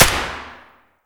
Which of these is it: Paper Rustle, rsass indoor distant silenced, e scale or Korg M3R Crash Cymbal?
rsass indoor distant silenced